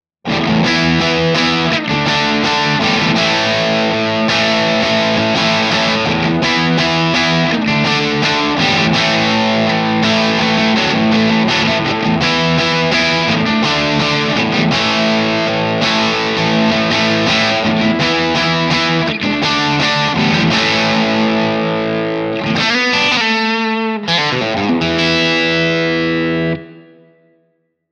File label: crunch; jensentone